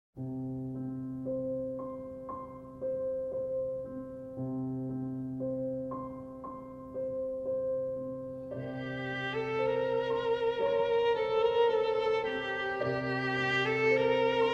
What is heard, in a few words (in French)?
Violon et Piano, Nocturne